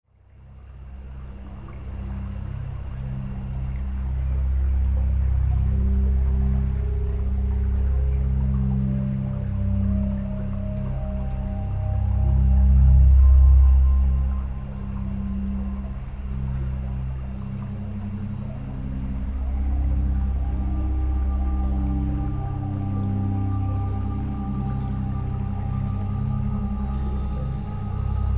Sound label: Theta Meditation